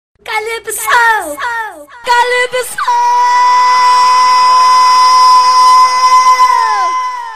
grito calypso

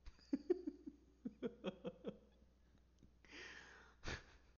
risadola baixa

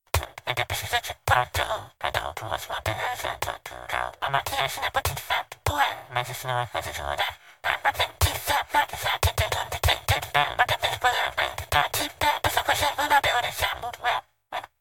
villager cantando

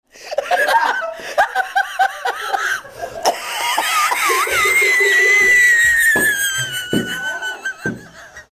risada da tia

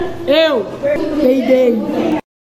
kauan peidou

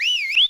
assobio

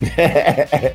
hehehe risada

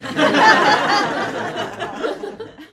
risada de reacoes

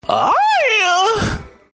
grito gay

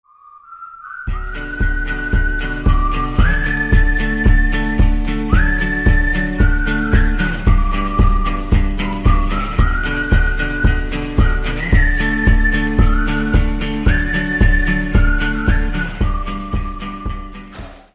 assobio

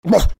coro

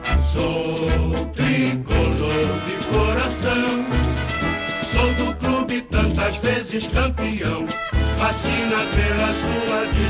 hino fluminense cantado

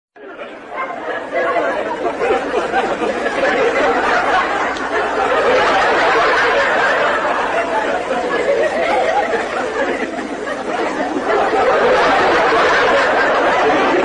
risadas; chaves

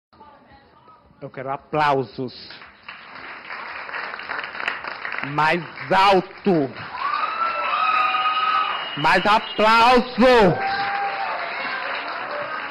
aplausos mais alto

